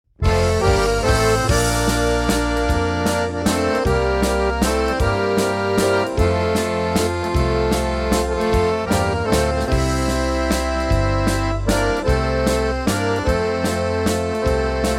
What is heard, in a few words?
Waltz